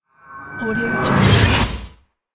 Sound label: whoosh tech transition